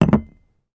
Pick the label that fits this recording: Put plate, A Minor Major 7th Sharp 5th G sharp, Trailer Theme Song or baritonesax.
Put plate